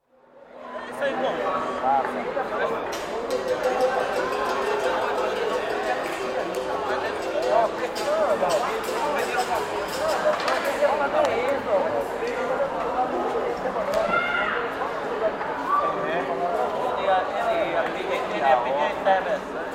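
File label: Oxen pulling wooden cart through swamp (recorded from cart) occasional distinct speech from drivers, also splashes; BBC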